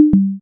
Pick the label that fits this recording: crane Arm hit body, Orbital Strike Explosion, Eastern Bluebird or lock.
lock